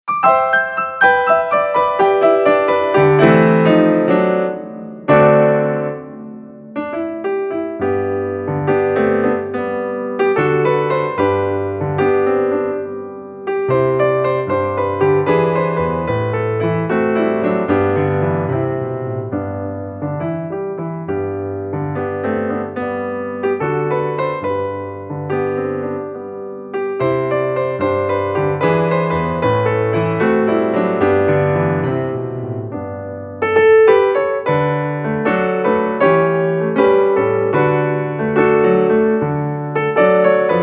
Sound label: La Danza solo piano